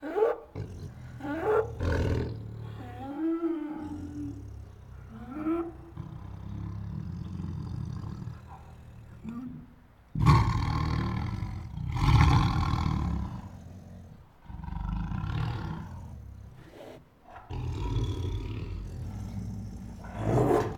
Siberian Tiger Protective Growls, Roars and Snarls; Tigers; Sound Ideas